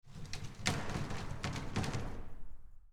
matter mayhem plastic big container dragged bouncing; soundmorph